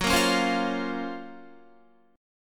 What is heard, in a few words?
Gb Major Sharp 11th, Guitar; GbM#11 strummed